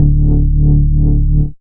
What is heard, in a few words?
Bass Shot D; Mutekki Media; Vengeance Electroshock Vol.2; Bass Shots